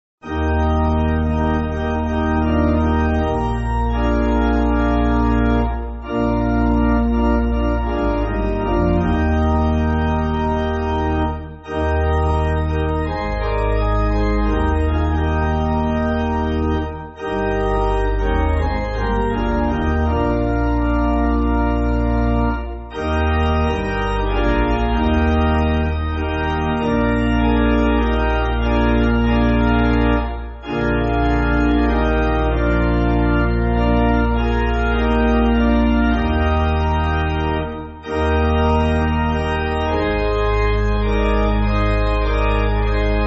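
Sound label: Pipe Organ, church music; Where May I Find; Ambresin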